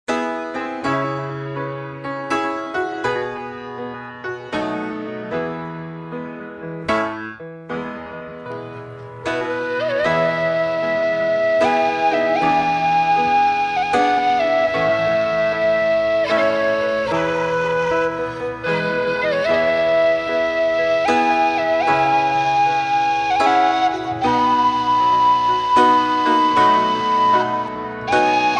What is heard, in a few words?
Flute; Bethlehem Flute; Amazing Grace